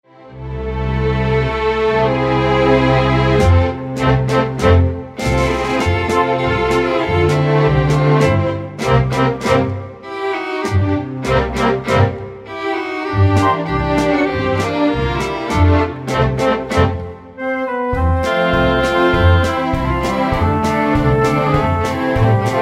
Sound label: Live Orchestra Musical Theater Backing Tracks